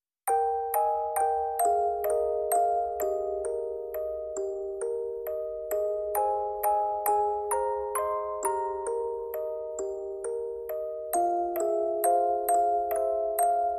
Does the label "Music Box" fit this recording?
Yes